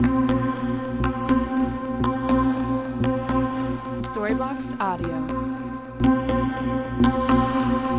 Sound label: Ambient House Arp Delay 120BPM C